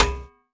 Percussions

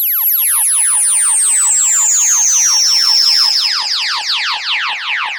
Monotron Sci-Fi

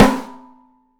OPEN PICOLO, 3D Drums

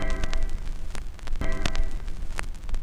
Crackle Loop, Vinyl Noise

